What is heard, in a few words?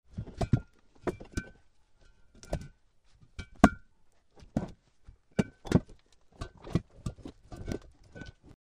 Ceramic Rock Movement, Move In Place; rocks